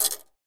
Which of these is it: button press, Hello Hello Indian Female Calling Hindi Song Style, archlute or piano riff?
button press